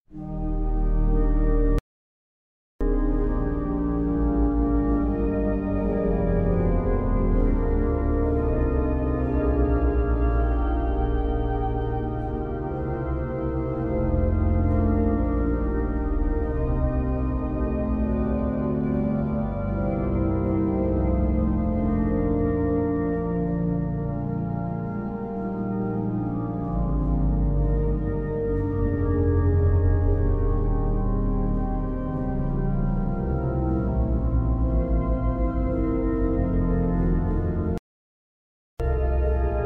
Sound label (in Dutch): Canonisch voorspel Psalm; 84 (Jan Zwart)